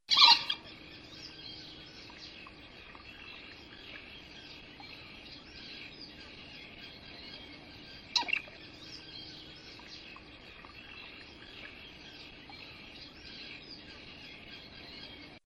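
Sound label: common moorhen voice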